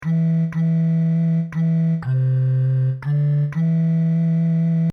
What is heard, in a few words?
I love you Lord my strength Bass; Psalms